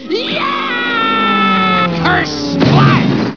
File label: ker splat